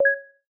Total Point Slide

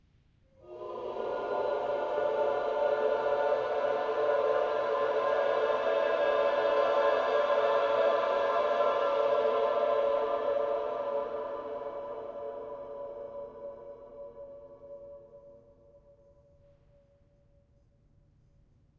Choir; Outlast 2; PS4 Windows Xbox One

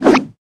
Giant jump; OvenBreak 2; iOS mobile

